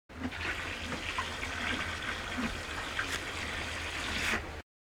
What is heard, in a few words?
Animal effects snorts grunts farm ambience